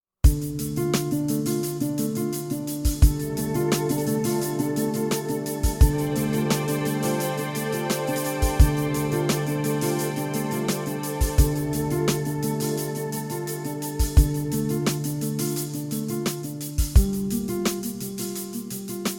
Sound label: brass minus